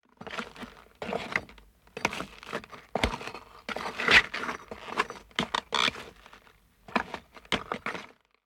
Footsteps debris long; The 39 Steps

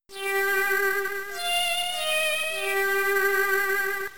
Sing; Pokemon; Gen 3; attack moves; RSE

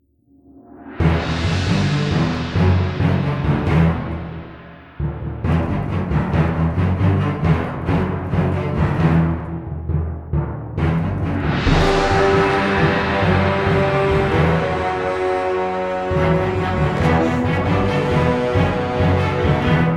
European march; World in Conflict gamerip 2009

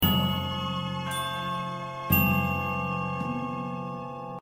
Orchestral Suspense; freaky clown town mystery windows 2020

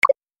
Button Blip; Infernal Radiation

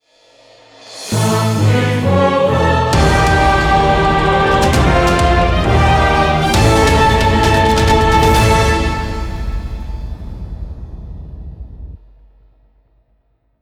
Fanfare - Victory!; Darwin Project

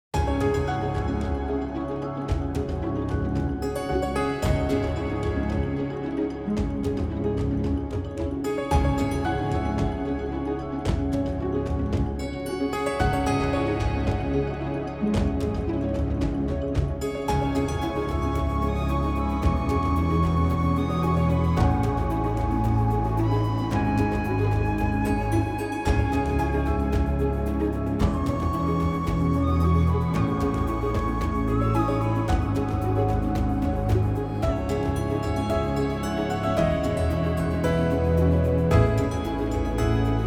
Spirit Trial Loop; inkwater Marsh

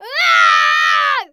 VOICE Girl 4yo Scream

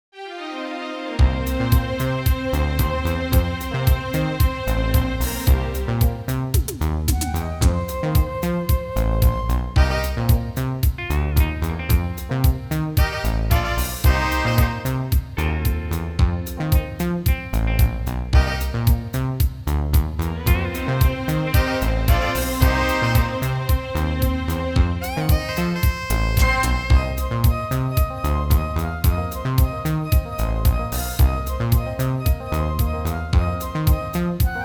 Tentacle Disco, midi; Day of the Tentacle

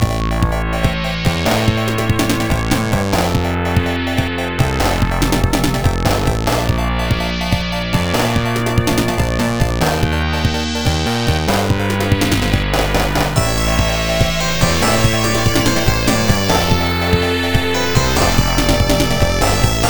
Story Sequence; shura no mon genesis

